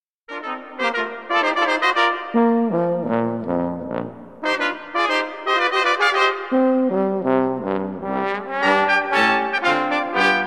Tuba

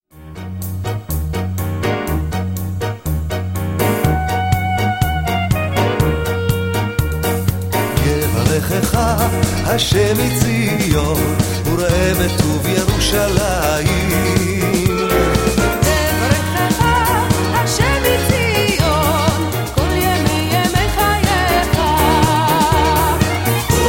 Vocals

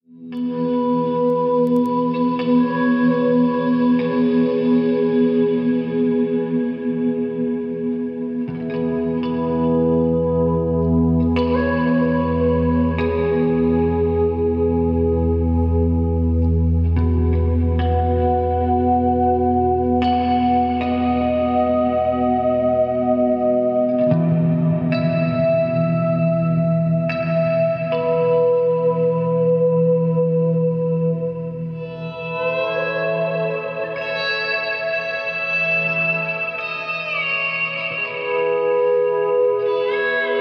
silent night guitar reprise

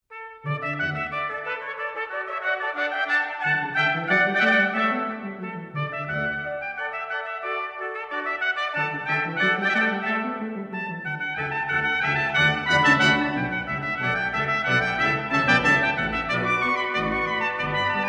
Instrumental

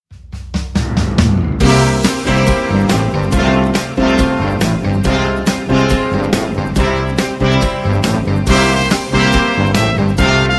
Brass, Studiochor

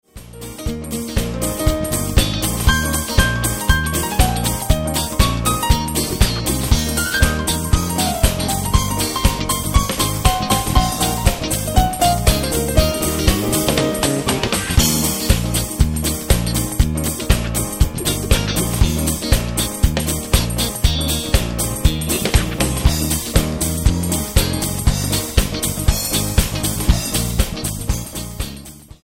PLAYBACK Amen

